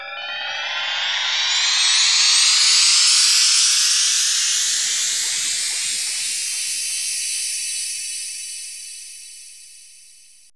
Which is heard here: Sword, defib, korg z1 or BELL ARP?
BELL ARP